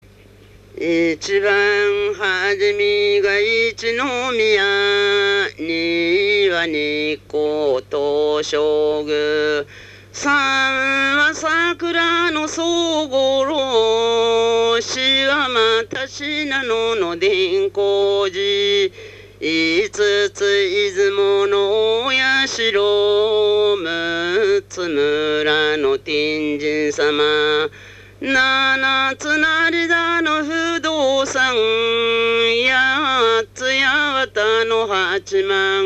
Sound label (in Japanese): temariuta, minyou